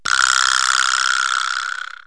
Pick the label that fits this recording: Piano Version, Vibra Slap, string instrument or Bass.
Vibra Slap